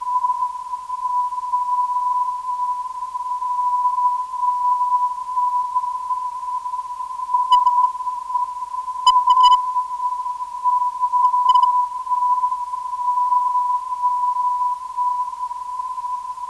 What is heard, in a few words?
rg 336.92khz, ndb